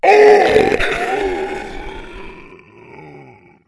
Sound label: die; basebuilder; hellgame; cstrike